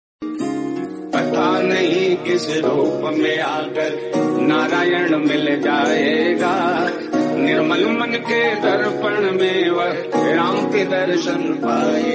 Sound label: Bhakti devotional Lord Ram; Narayan